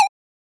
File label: retro 8 bit coin spawn